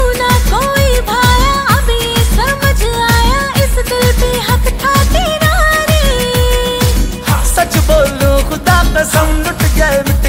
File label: hindi ringtone, bollywood ringtones